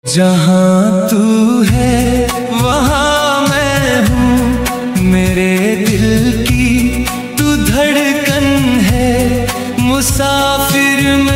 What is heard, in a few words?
AI Ringtone